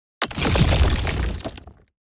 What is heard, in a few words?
building destruction build